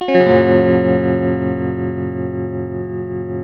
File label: TELTMUMAJ G, TELE CHORDS, Guitars; Spectrasonics; Hans Zimmer Guitars VOL-1